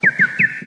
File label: birds chirping; build-an-atom